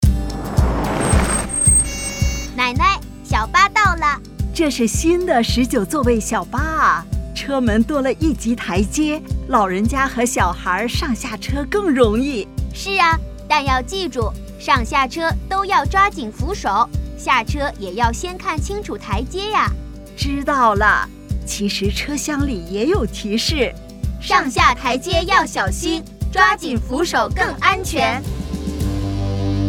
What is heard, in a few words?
with Beep